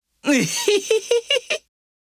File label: Vo Male Uhihihihi; gekiban